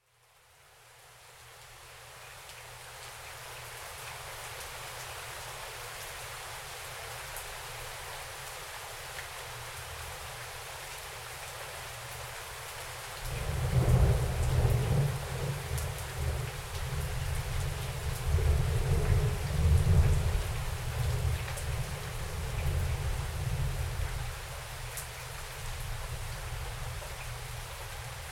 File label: Rain and Thunder Ourside